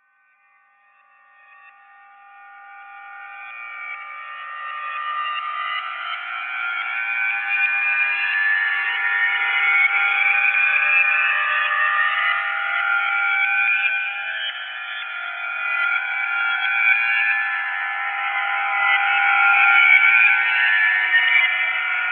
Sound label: chimes spooky and slow; Slow rising dangerous stereo sound effect. Slow metallic reverse sound for builiding atmosphere; clangs metal, clank, tension, fear, danger, horror, drama, risk, suspense, jingle jangle